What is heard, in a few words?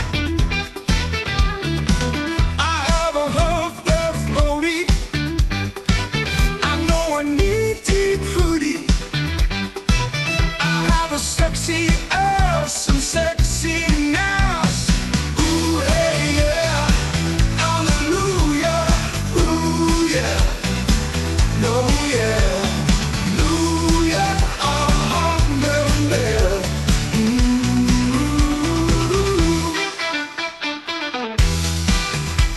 ooh Yeah